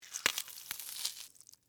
Tear Rip Watermelon, Texture, Tear, Rip, Watermelon, Flesh, Fruit; Ripping; BlastWave